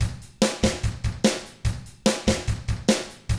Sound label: rock drums